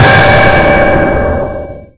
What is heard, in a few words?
sonar Tail Water Very Close Shuttle; Barotrauma; Real Sonar